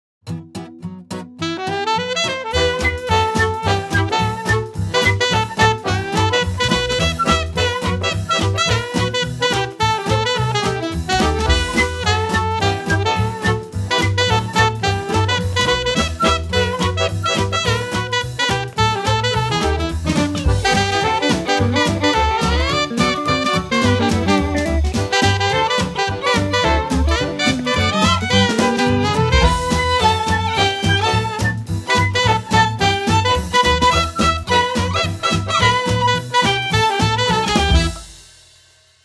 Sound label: Sautés, exam music